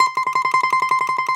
DOMRA, Prima Domra, Plucked Strings

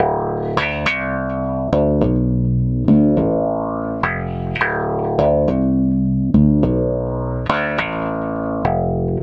Stick Loop, Stick Bass

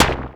Percussive, Drums Perc One Shots

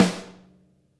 SNARE, Interactive Drum Kits; Sonic Reality; ID SNARE 1+

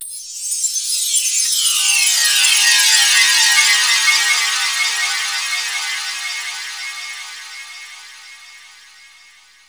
GLASS BEND, GLASS BENDER; Spectrasonic Distorted Reality 1

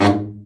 BRA Bari, SAX; Best Service Gigapack 1; BR SAX 2

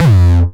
909 Grime F2, Kicks; Mbase 11 Kicks

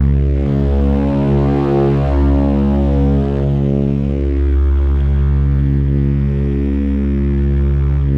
C#, Synclav FM; Big Fish Audio; SPACE2

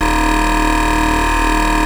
OSCAR D#1, Synthesizer; Hollywood Edge - Giorgio Moroder Rare Synthesizer Collection

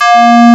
SYN CLAR T, FAIRLIGHT CMI IIX, WOODWIND

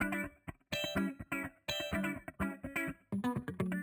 125 E Dry, melo filter loop; Saturn; RoBKTA Sample Pack Supreme

